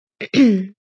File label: Woman Clear Throat, A woman clears her throat; Clearing Throat (Sound Effects:Human)